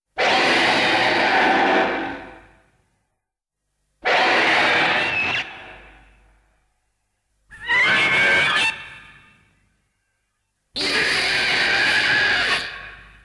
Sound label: Roar; Megalon